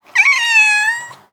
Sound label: Kitten Meow; SES Animals SFX